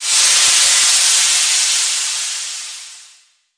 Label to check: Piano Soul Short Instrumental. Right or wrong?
Wrong